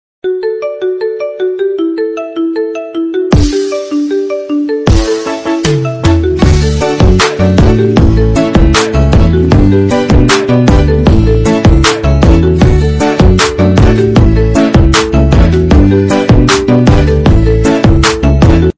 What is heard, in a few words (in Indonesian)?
nada dering xiaomi remix